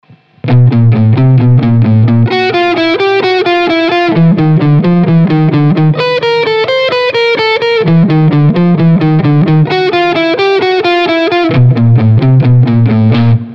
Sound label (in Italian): Salto di tre corde